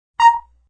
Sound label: Bleep; Beeps